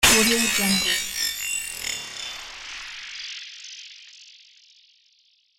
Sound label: Sci-Fi Break Window